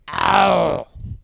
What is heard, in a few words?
pain